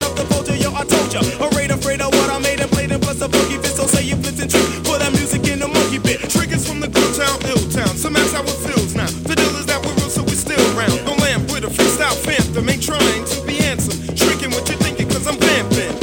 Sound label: hip hop